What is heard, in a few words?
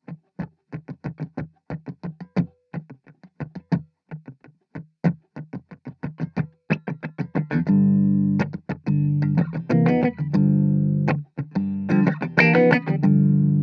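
Gm guitar funky riff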